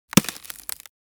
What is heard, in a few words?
Ice cracking with soft pressure short